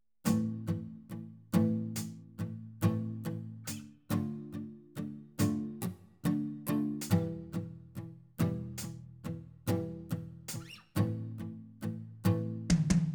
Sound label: Delay; Audified STA Effects Bundle